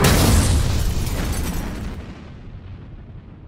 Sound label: explosion with glass